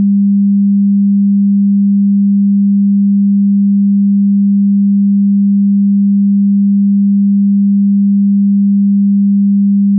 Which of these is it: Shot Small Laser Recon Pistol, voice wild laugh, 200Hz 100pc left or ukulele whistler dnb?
200Hz 100pc left